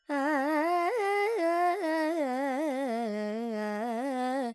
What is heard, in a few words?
Pentatonic, Vocals, Carnatic Female, Alaps, Dry; Sample Banks